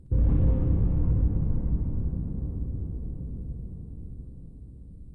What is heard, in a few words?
Pipe Machine